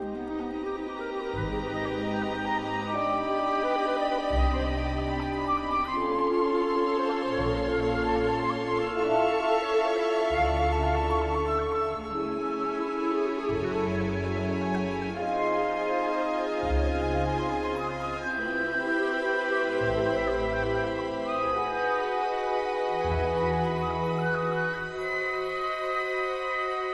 Woodwinds

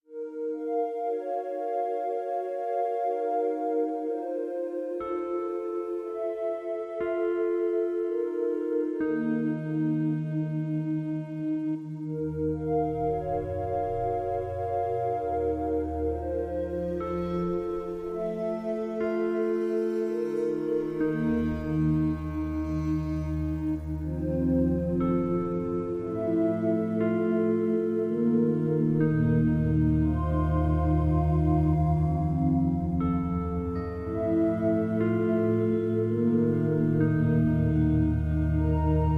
Metaphysical Drone, Light

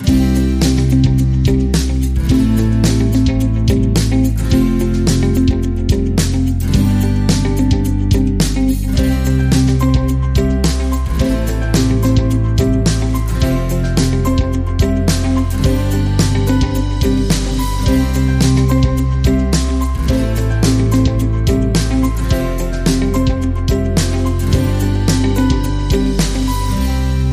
Acoustic Inspiration Underscore

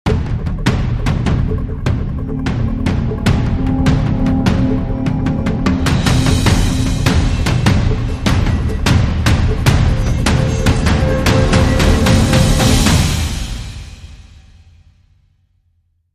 Dramatic Sting

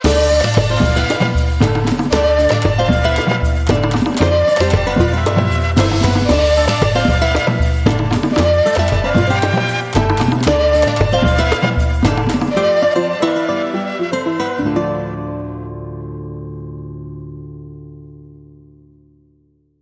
Latin World Groove

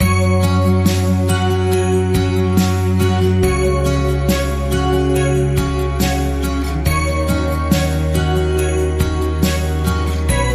Joyful Acoustic Christmas

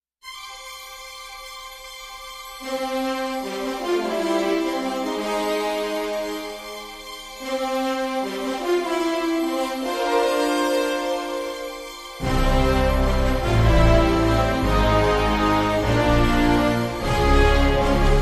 Fanfare

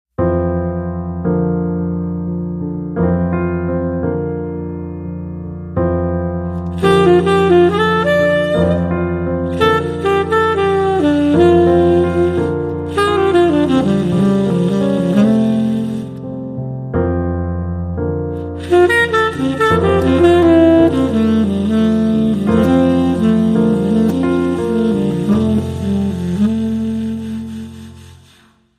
Saxophone

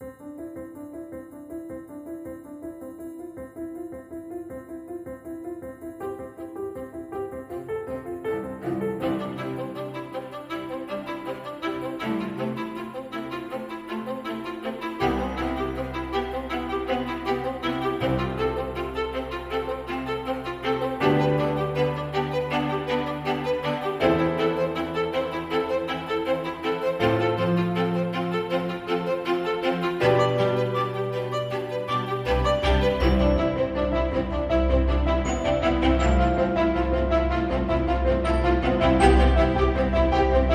Uplifting Piano

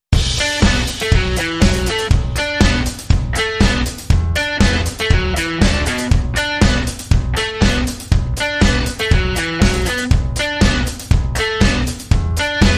Funky Fuzz Rock

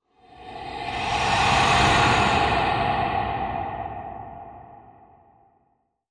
Light Transition Ghost Swoosh

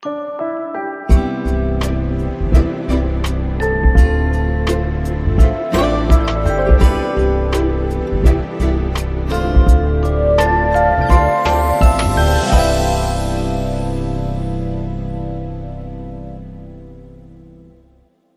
Long Sting

